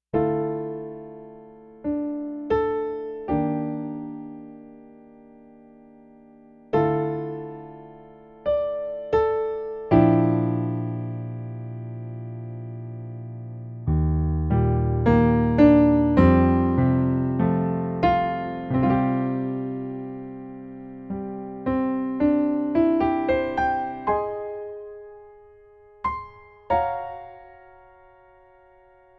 piano solos; sabbath morning songbook

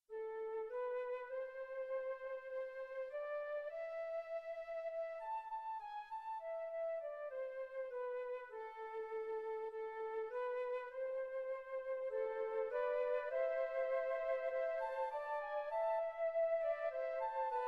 Flute, flute quartet

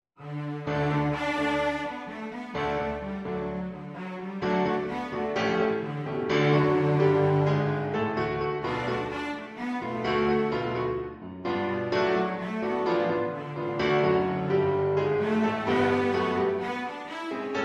rock Cello and Piano

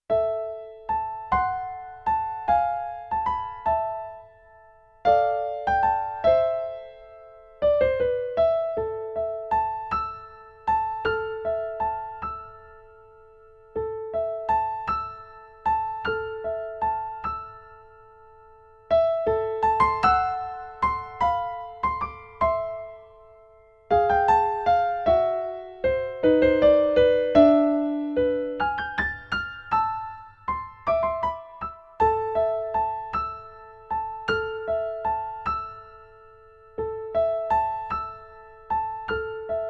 early intermediate piano solos; piano remixes classics vol 1

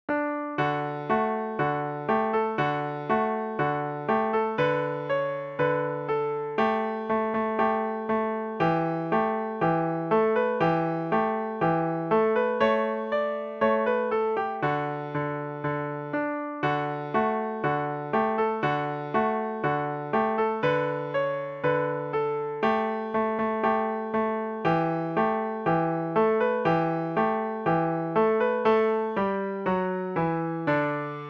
piano solo; beginning piano solo; snow fantasy

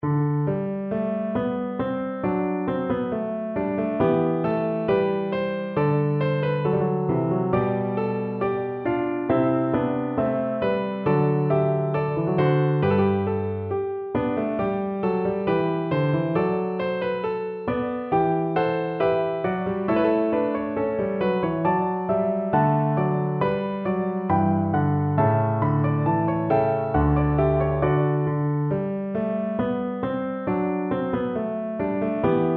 Piano; bwv 988; as played by vikingur olafsson

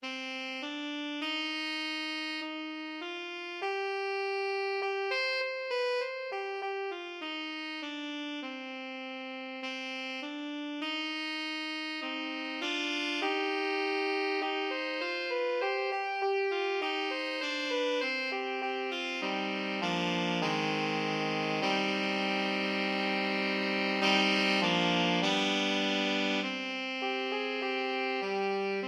Sax Trio; saxophone trio

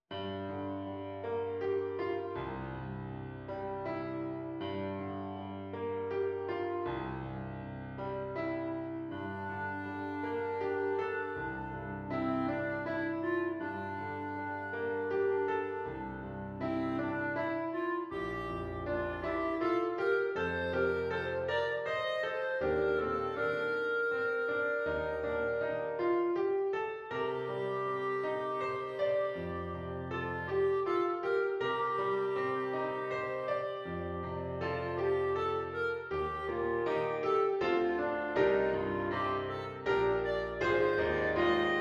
Beautiful Dream clarinet; bass clarinet

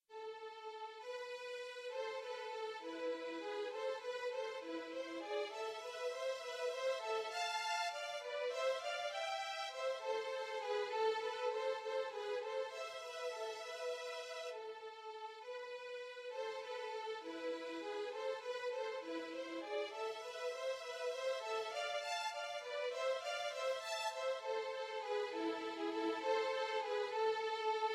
violins, violin duet

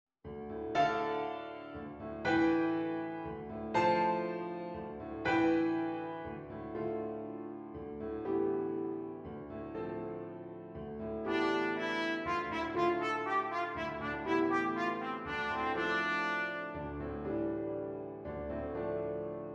trumpet flugel or cornet

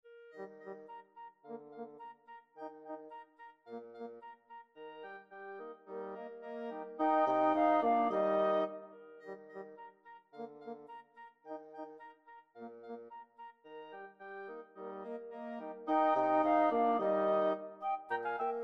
wind quintet; Beethoven as adapted Seiss; country dance

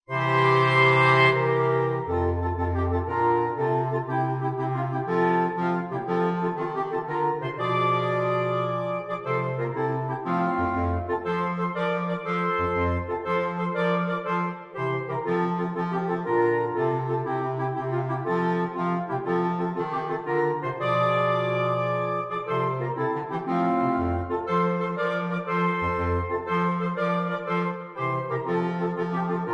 Clarinet Quartet or Group; For He's A Jolly Good Fellow; The Host

